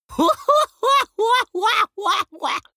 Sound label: laughter gloating; vocals; comedy-cartoon; soundpool